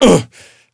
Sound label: pain, player